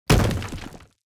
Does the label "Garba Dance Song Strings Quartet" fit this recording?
No